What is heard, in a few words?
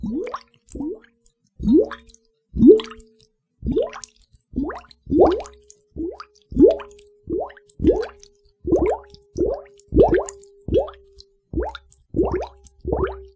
Bubble Chain; Bubbles